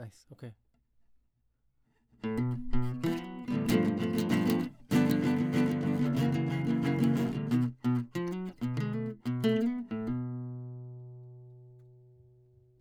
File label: Classic Guitar